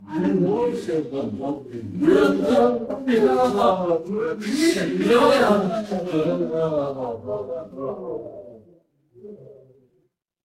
crowd gasp

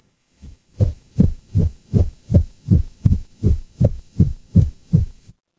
animal horse gallop

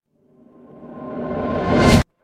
transition riser

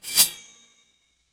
impact sword

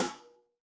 impact metal hit

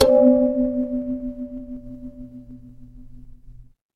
instrument kalimba

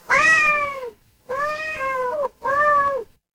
animal cat meow

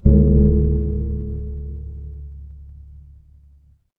instrument gong

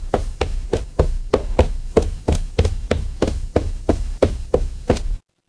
footsteps wood

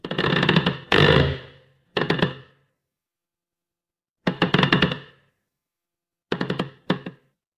horror creak

